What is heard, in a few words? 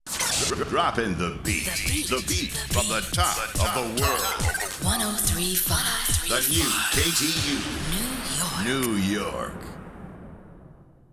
drop beat; Audicy; Cart Chunk AES46; KTU